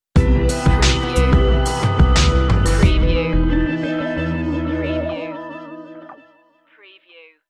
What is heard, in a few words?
slow rock stinger